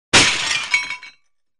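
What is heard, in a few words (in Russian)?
разбилось блюдо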